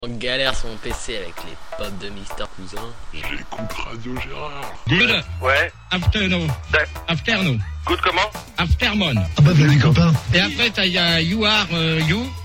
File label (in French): jingle